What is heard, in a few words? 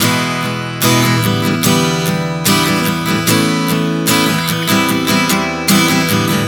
Progression 074 E-G-A-C, Acoustic Guitar, Chord Progressions 074 bpm; Sonic Foundry; Songwriter Acoustic Guitar Companion